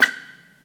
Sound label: Shoe Sole Rubber Squeak Tile Hall